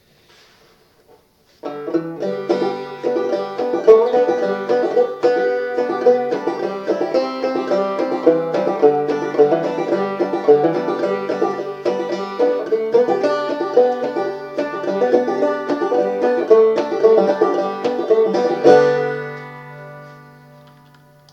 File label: circle banjo, Banjo: Open G; Capo 0; Will the circle be unbroken